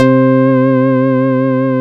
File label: SYN STRANG, SYN MicroWave; Sound & Vision - Gigapack I CD 2